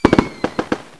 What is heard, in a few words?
fireworks explosion; cstrike; snowwars